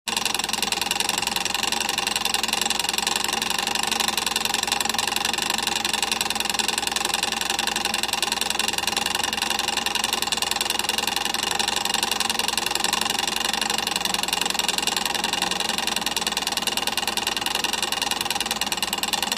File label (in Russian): treschetka tajmera